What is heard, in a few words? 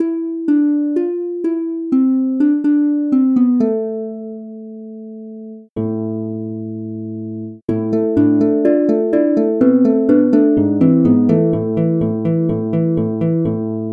harp